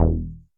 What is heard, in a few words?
Moog Bass E